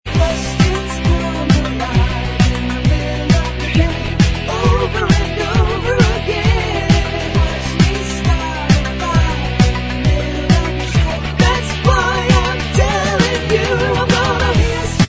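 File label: backing vocals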